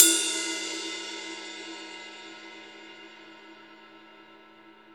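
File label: ride, Zildjian; Best Service; Real Mega Drums VOL-1; WHGM KIT 4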